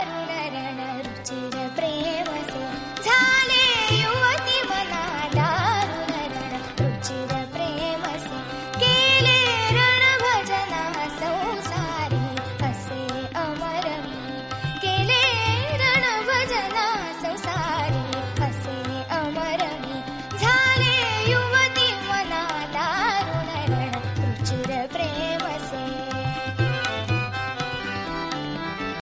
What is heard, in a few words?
best marathi tune ringtone